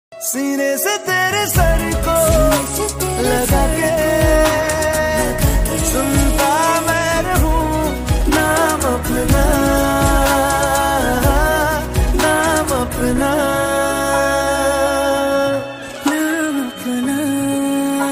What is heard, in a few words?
Romantic Hindi Song Ringtone